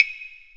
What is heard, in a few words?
Percussion; Sky Children of the Light gamerip